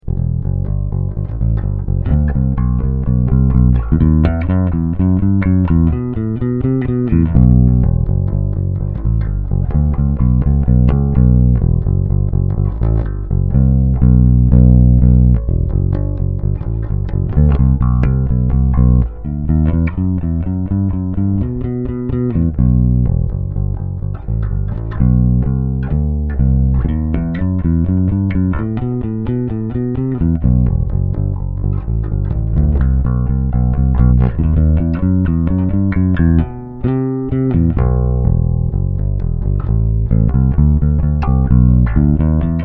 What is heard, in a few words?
bass sound sample neck, bass guitar; shishido